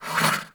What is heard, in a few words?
Wyld Blind Shot; Powers; Fey; Saboteur